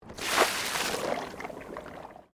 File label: Water Splash Concrete Gurgle; Elemental weather effects; Nature